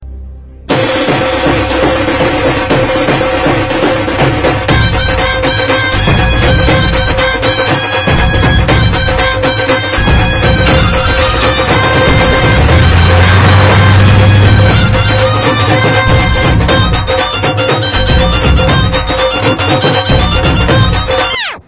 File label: love flute, ringtones